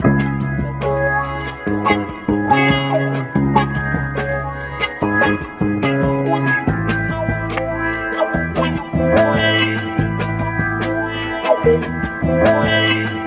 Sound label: guitar sweet, Loops, Phonky